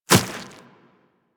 Infested Small Walk Dirt, Footsteps; Warframe

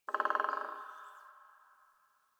Bird Woodpecker; Warframe; Ambience; Nineteen Ninety Nine

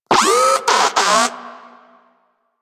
Moa Compassionate Vocal Stomp, Pets; Warframe

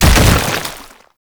Bodyfall Light; Enemies; Warframe; Proto Infested

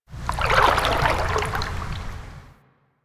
Water Lapping Gentle Medium; Ambience; Warframe

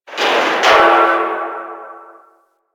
Landscape Construction Site One Off, Ambience; Warframe; Venus Open World; One Offs

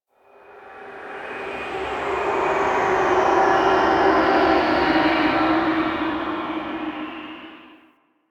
Exterior Void Fog, Ambience; Warframe; Rail Jack

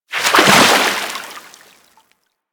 Generic Roll Water; Characters; Warframe

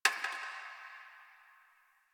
Ice Crack One Off

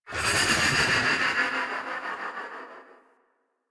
Fairy Move Thrust; DE; Warframe

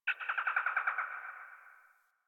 Birds Low, Ambience, Plains, Landscape; One Offs; Warframe; Eidolon Plains

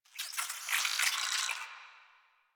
Space Drone Attack; Warframe; Corpus Space Drone

